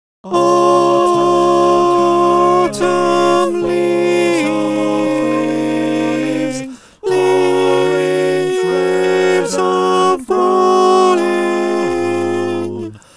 alt, choir

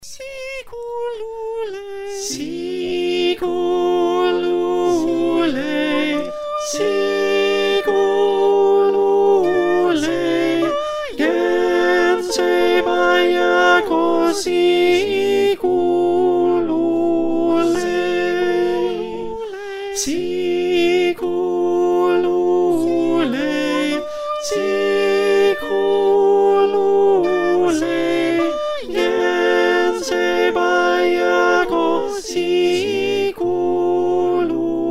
Alto

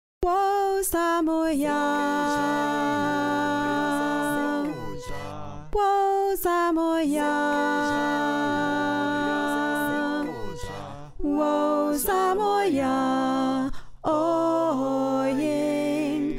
Alto, choir

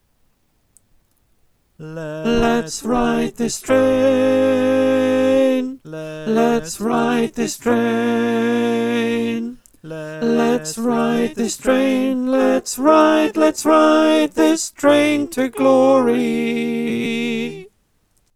Tenor

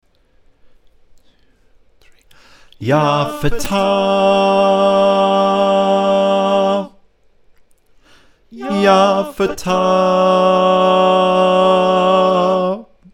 bas

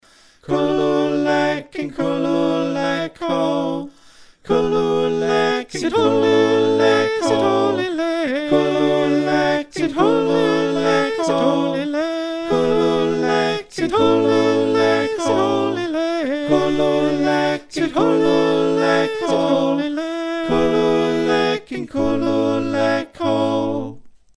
Call SATB

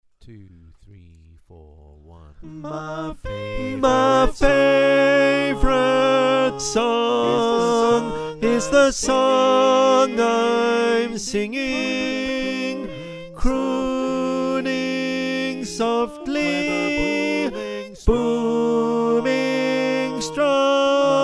Tenor, choir

